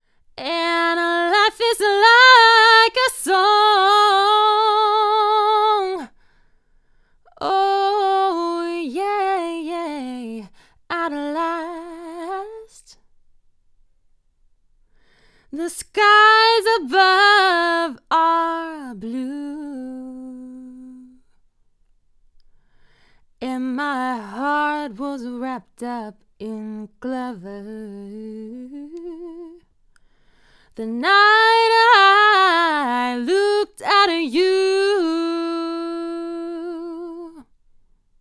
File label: aggressive mids